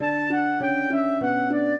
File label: minuet, flute harp; Mozart